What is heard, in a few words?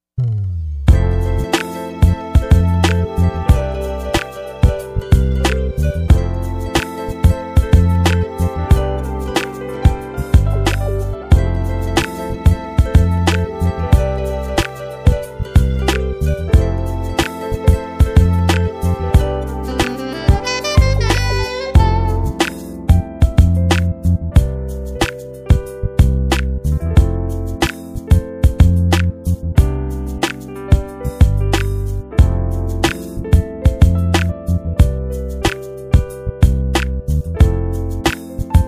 smooth jazz